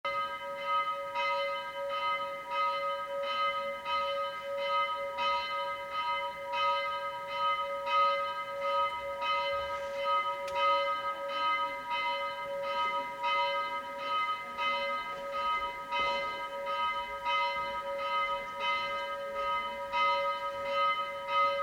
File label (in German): Glocke; dom st martin rottenburg